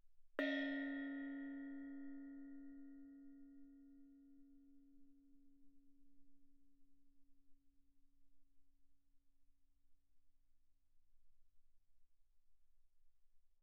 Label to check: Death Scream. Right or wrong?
Wrong